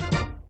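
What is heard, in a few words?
fanfare end of ball, pinball; sfx; WILLIAMS; INDIANA JONES; Indiana-Jones-Original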